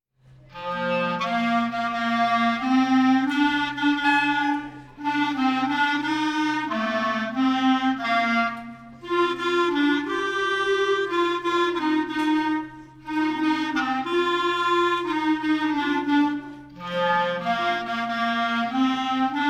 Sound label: O Christmas Tree Clarinet